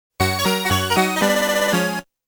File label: gameover